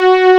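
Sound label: G4, SYNTHESIZER, analog STRINGS; AKAI S6000 CD-ROM - Volume 1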